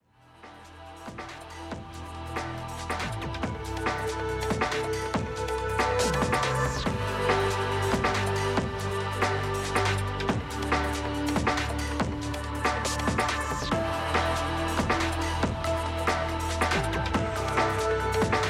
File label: Stage Abstract Chill Background; GunboundM; Android